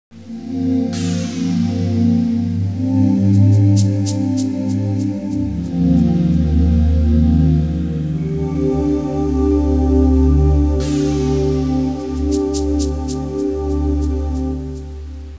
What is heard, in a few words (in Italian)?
audio suonerie musica mistica